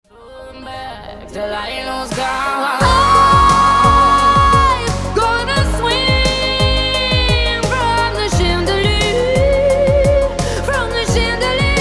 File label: Yamaha Genos2